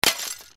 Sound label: Glass Impact